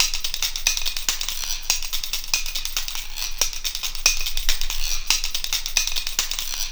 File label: CHINA SPOON; Zero G - Ethnic; CHINA SPOONS